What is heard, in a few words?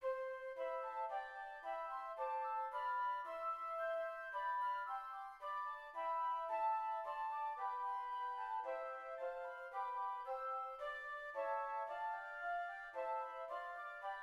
Flute; Spread O Spread Thy Mighty Word (Gott sie Dank)